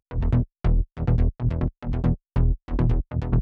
Incast Kit 140BPM Bassline Deep, E minor; Vengeance Samples

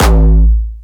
A1, Jumpstyle Kick Solo; Vengeance Samples; Bassdrums; Jumpstyle Multis

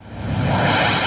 Short Fills & Reverse; Vengeance Samples

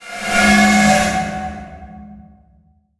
VFX Atmos

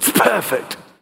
Vocal Phrases; Vengeance Samples; Vocals

